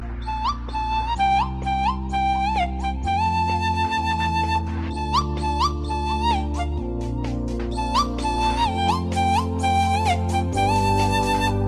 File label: Cool Tone